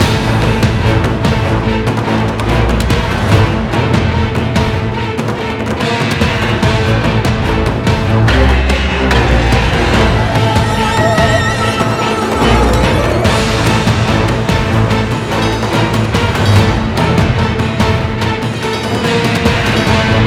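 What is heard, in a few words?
background music boss battle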